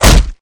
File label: kick hit; weapons; deluge